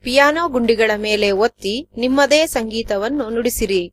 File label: piano composition; gcompris-data